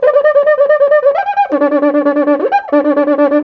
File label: 130 bpm, Rio batucada